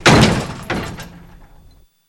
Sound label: Jeep Crash Jeep Glas, car crashes